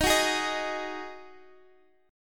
D sharp Minor Double Flat 5th D sharp, down, Guitar, Open D